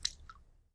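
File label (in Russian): вода капля одиночный далекий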